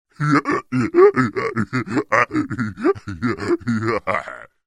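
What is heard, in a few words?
sounds of giant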